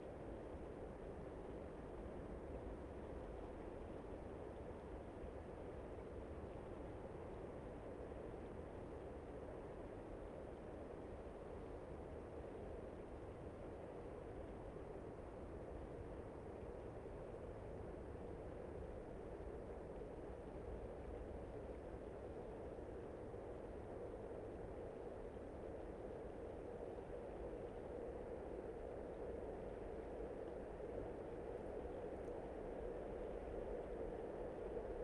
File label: express train medium squeaking long tails; sonniss gdc